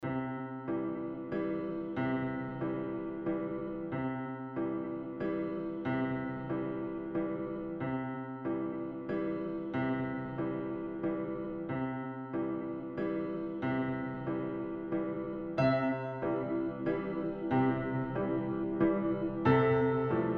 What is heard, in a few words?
waltz